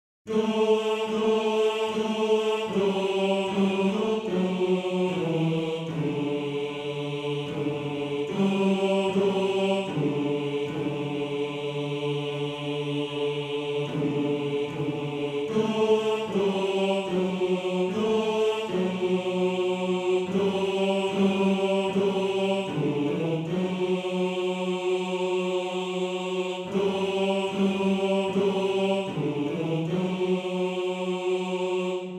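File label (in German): Hör in den C Bass 1, Männerchor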